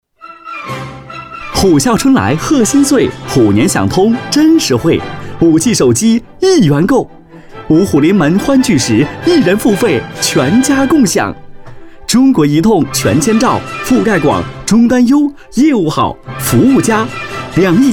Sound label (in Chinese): 广告 新年 欢快时尚; 中国移动新年